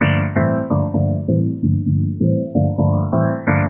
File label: 130 filtered-epiano-rhythm, melodic loops